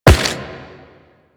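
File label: run on rock, footsteps; Warframe; The New War; Kahl; Quests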